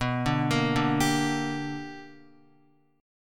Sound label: B Minor Major 7th Sharp 5th Guitar; BmM7#5 arpeggiated; JGuitar